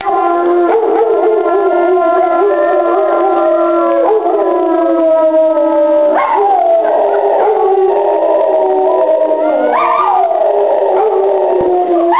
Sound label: river club bugle dogs howling, victoria falls; mono